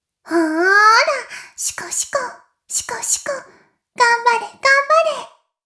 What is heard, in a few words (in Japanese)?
妹 しこしこ音声; おにいちゃんアイスぅー！